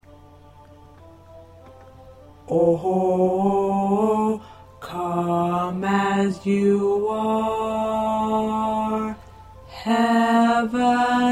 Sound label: Baritone